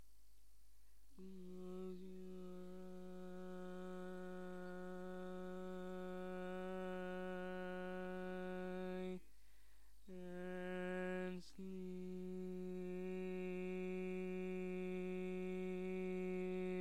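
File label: 5 parts Baritone, barbershop tags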